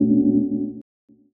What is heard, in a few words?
Chords Tones; Equipped Music; Loungin House